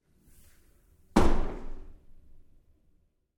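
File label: Door Bathroom Stall Open Close Up Lite w Bounce; Big Room Sound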